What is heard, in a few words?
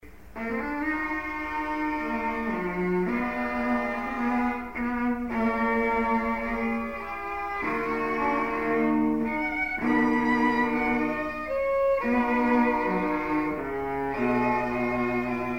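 string trio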